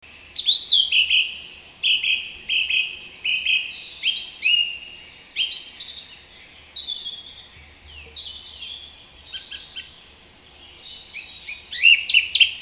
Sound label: turdus philomelos, vogel; hecke; biologie